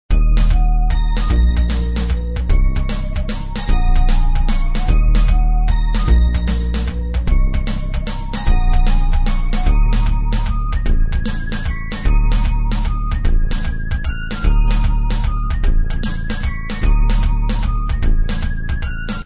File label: Loop Fast; Trick or Treat Smash Online; Windows